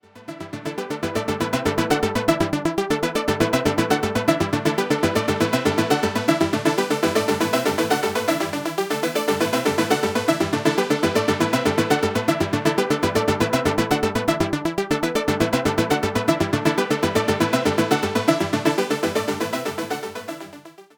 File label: Analog RAW